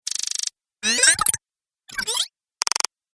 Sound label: Sci fi Activation Sounds; Science Fiction Sound Effects